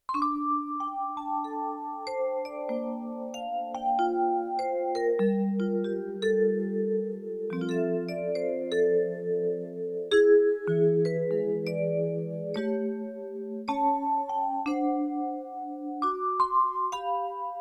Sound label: Chorus & Tremolo, Vibraphone, Yamaha TX16W